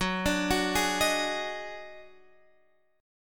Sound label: Eb Minor Major 7th Double Flat 5th